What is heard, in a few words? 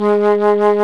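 Alto Flute vibrato; Roland L-CDX-03 Disk 1; A.Flt vib 2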